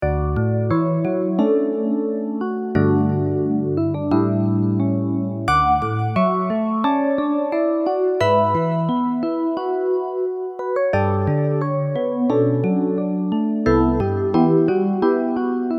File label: FM Piano Vibraphone; Bencao; Studio 55